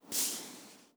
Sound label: Soft Slide Medium